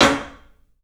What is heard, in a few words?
club snare, 3D Drums; 3D Sound